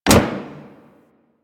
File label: Ship Hit Effects